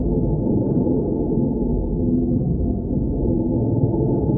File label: robot; spacemap; robots